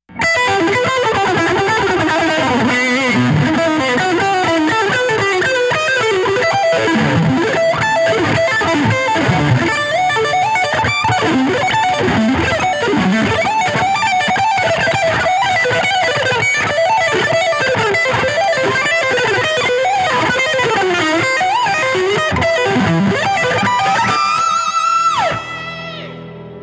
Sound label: trailer; renatinhos tauros; running vestigios 2010